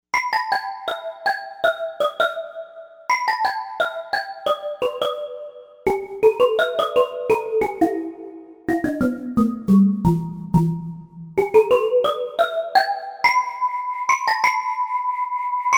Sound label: Synth Bottle; Novation UltraNova; Factory Patches